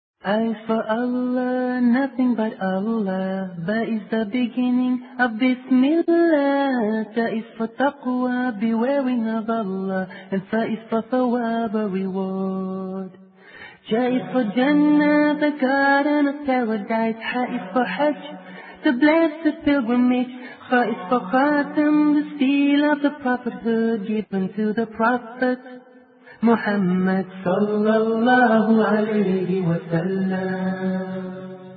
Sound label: Islamic Ringtone